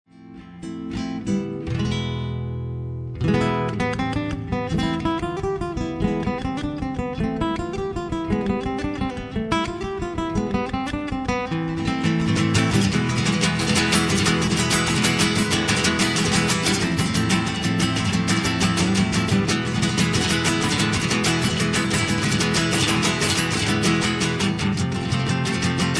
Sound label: Flamenco